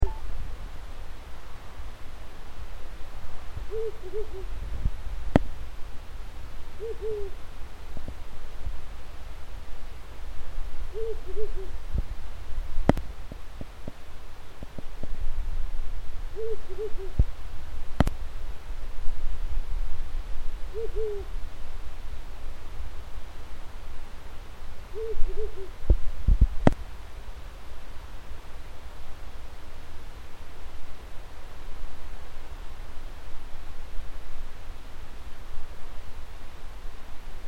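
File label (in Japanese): hukurou, owl; フクロウ; 家の近くまで年に数回やってくるフクロウの声を録音してみました。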